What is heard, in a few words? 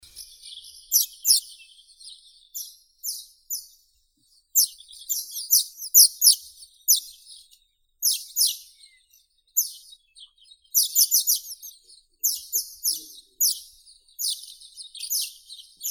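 house martin, Delichon urbica; lastochka gorodskaya; voronok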